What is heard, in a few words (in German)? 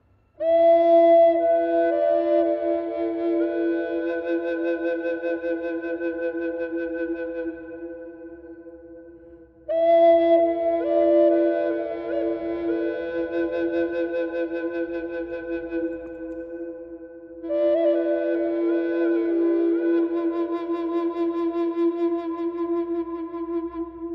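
F mit Reverb, Native American Drone Flutes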